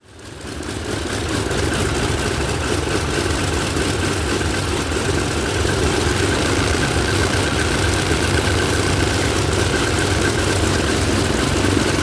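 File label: airplane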